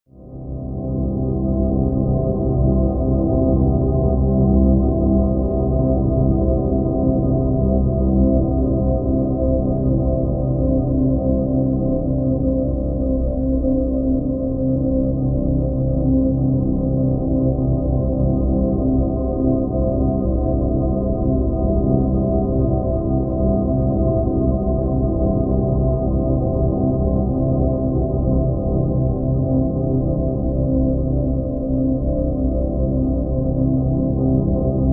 drone soft sci-fi

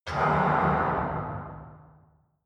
metal large hard

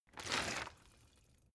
bottle water sparse upend soft; berufe

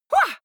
female fight shout attack short; vocals; comedy-cartoon; soundpool

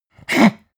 pug gag breathe short; natur-tiere; hunde

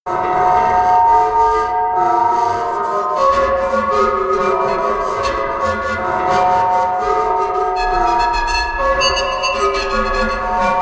drone monkey drone

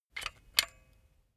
silicone gun bullet lock mechanism regular; berufe; handwerker; soundpool

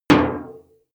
impact metal sheet short; soundpool

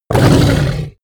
dragon hit; kreaturen

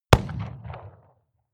revolver shot 44cal remington 100m; schusswaffen

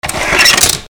lock release; haus-garten

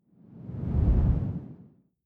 whoosh simple long low; swooshes-swishes

